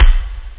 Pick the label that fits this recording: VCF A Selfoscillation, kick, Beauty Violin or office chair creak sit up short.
kick